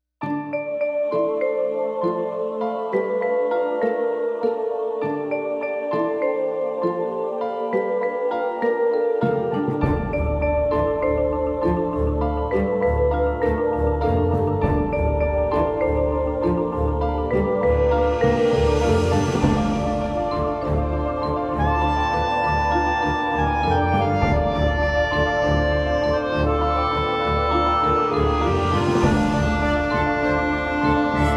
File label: Sci-Fi